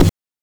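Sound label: BRK BD, bass drum; NES DPCM; MIDINES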